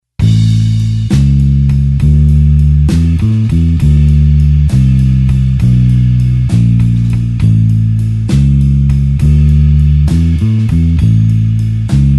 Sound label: blues bassline slow A